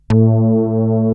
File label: Synth hits and riffs